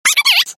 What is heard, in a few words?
clock